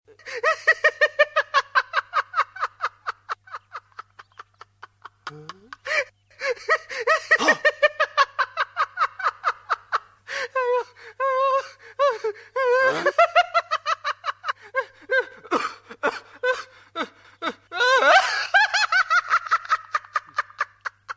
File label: laugh friends; ringtones; funny